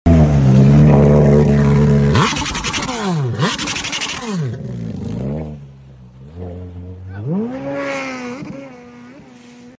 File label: jet ski; جت اسکی